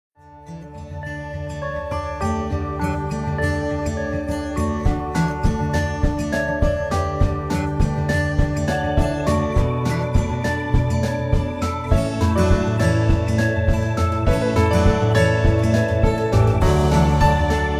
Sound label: am alarm, sony ericsson